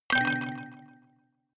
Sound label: notification kalimba delay third up